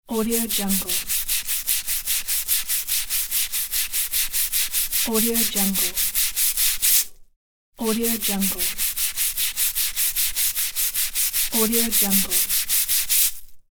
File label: brushing